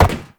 Uriel Step Metal; Warframe; Movement

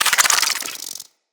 Infested Ashen Move Start

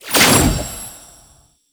Mirror Defense Rune Shot; Warframe; Entrati

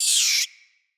Deco Rat Squeak Angry C; Ambient; Vocals; Verminia; Animals

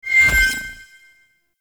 Archon Shard Ephemera Hide, UI

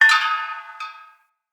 Bell Swing Short; Enemy; Corrupted Sproutfolk; Foley

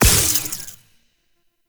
Corruption Pickup Small; Warframe; Missions; Zariman

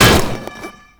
Umbrella Stand Destroy; Warframe; Ambience; Nineteen Ninety Nine

